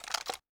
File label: ui pickup gun; Rust